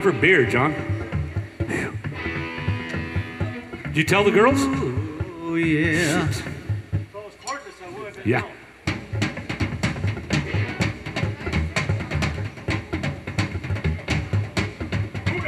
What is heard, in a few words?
Jam